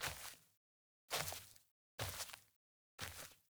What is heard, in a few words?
Envoy Steps; Bromius; Bromius Intro